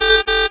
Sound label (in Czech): troubeni; Mafie 1